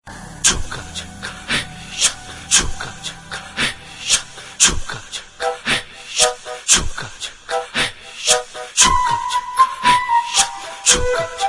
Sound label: Hard Beat